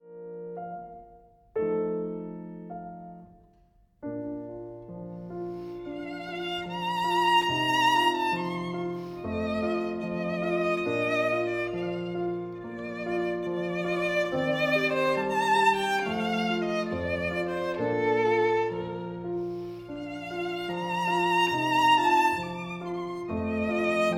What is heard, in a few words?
VIOLIN; Trinity College London violin exams for 2016-2019; Dancla: Air Varié on a theme by Donizetti op. 89 no. 4